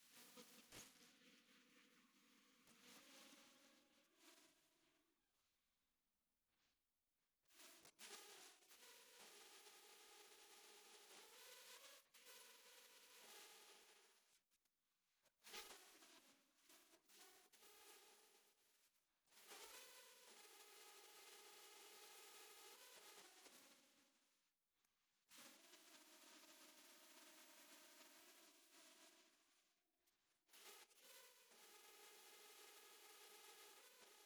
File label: Residual Saxophone Recording